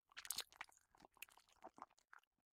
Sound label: Eat; Mita; miside demo windows gamerip 2023